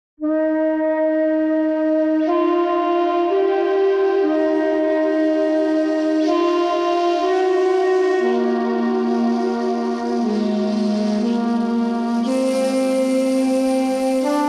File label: Shakuhachi